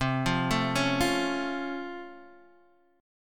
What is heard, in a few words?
Db Augmented Major 7th, C